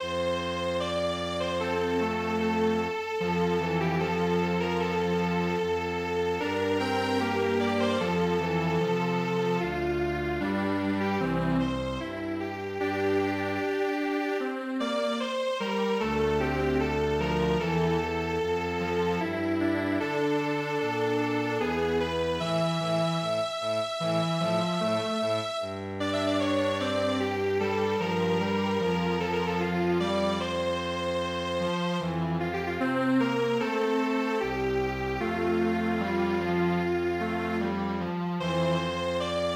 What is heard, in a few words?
chanson du toreador Strings Quartet